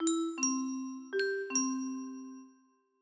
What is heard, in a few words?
train door